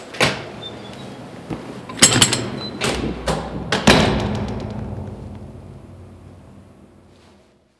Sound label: Door Opening And Closing